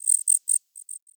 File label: Wetland Forest Day Insect Close; Ambience